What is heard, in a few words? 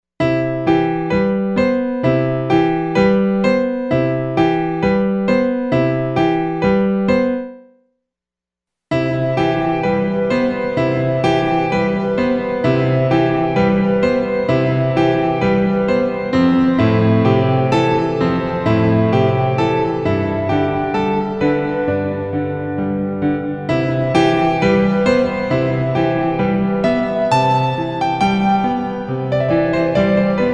VOCAL I